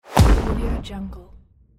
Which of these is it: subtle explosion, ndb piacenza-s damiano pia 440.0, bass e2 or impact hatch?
subtle explosion